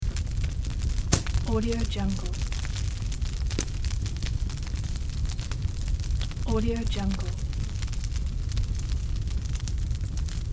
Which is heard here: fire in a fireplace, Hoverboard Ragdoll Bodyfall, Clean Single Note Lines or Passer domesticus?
fire in a fireplace